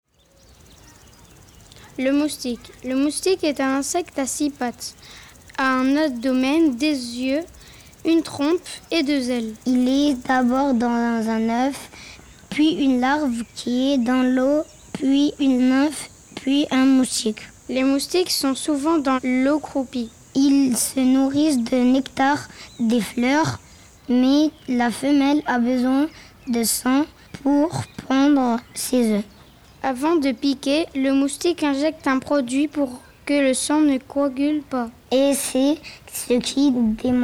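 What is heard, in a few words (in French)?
Le Moustique avec ambiance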